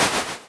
door

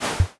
deer startle

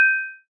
chime

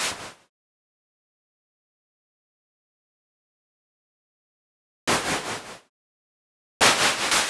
houses, ambience

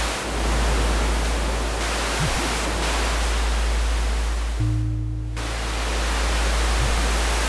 meadows night

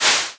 ash hiss

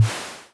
chest open

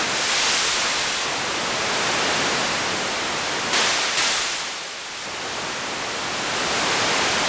meadows

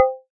bell hit

